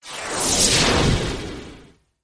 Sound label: skill effect